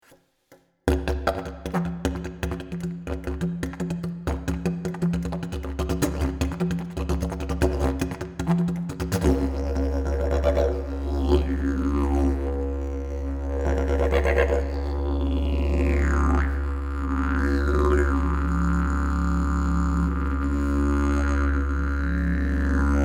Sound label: didge